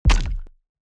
room damage kick

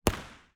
archimage projectile

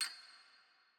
ambience room metal base

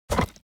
object grab stone

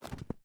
mobs landings

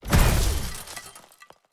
Orbital Spikes AoE Hit; TANK; 3Souls

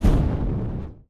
spell fire take; player

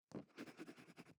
ambience room shadow room working layer

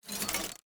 environment gate up

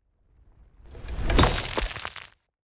npc stone golem big strike

